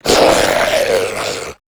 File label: zombie vox male runner run front; tf; slender; rave in the redwoods